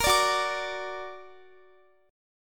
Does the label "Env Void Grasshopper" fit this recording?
No